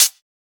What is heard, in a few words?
Closed Hat; FLPRO